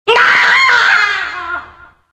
noise screaming

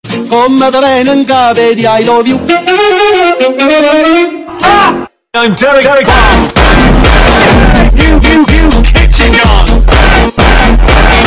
gun music; kitchen

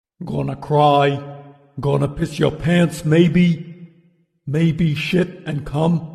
gonna cry

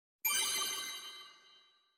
follow alert sound

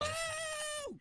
wooooo

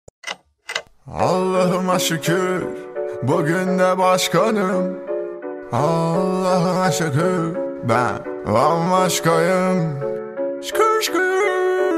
trap autotune; tayyip

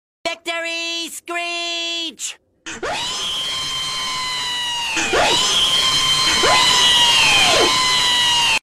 victory screech reeeeeeeeeee

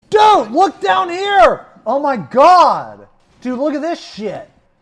disappointed

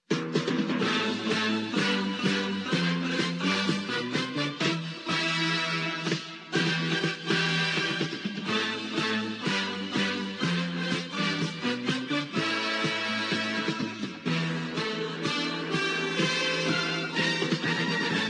action news theme

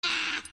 bahhh

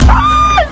masculinity alert

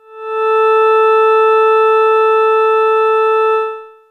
organ; ganymede

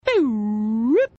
stinger transit sound

